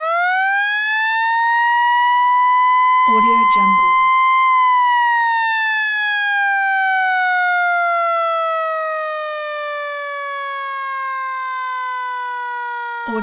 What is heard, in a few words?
fire truck siren